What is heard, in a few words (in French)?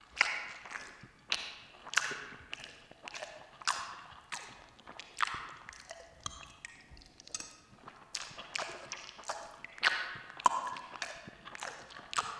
mange; Feed The Beast; Monster